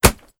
hitsounds

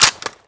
bolt forward, hk g3

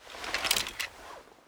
deploy, weapons; m14

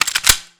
tec9 mag in, weapons

